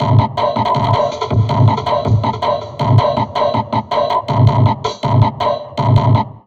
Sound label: Drum Loops Crazy Processed